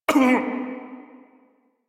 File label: Pain Male; en; Warframe; Railjack Crew; Arbiters Hexis